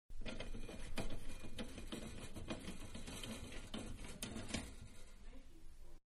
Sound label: Blinds Rotating Slats, Venetian Blinds; Windows; Household; Manhattan Production Music